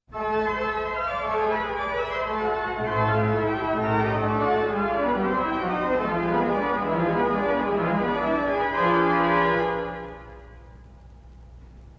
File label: chorale